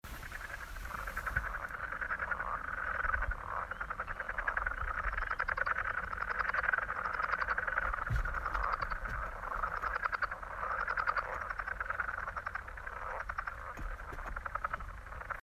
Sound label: Wood Frogs; Edgar Rd; Jim Brighton